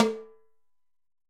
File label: Timbales H Open, Latin Percussion; Drum Hits; Ableton; Factory Packs